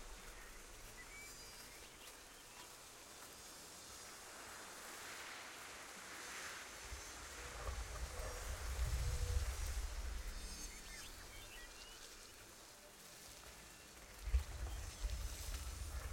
amb meadow; camavor

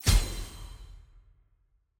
loadout rarity button click

